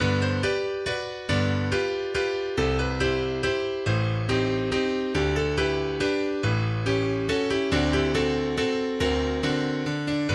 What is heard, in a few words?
Waltz, MIDI